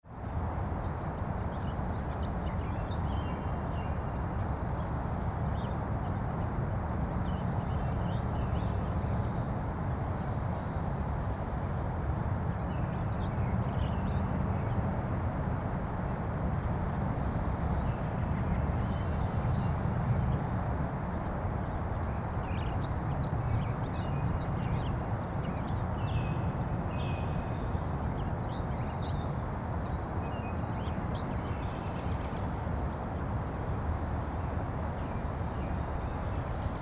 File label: city park ambience